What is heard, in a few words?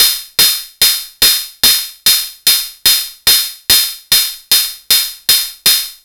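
closed hat, saturated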